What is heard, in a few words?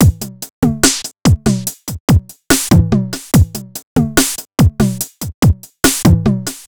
Crunch, Drums Beats